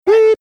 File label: bleep